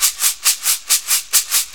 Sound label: Maraccas, Perc Loops; Sonic Foundry; Drum Tools